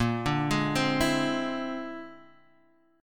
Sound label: Bb-7th Sharp 11th, Bb7#11 chord; Bb7#11 arpeggiated